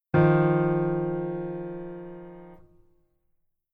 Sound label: Keyboard Voicing Basic